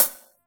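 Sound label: HI HAT, 160 BPM; Ueberschall; Drum & Bass Flash Trackz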